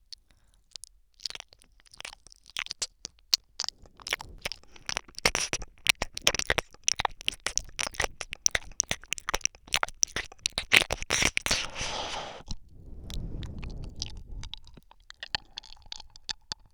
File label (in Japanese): 左耳 深め